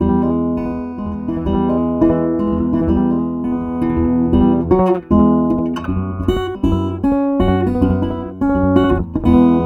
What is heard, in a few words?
guitar through dobro minus piezo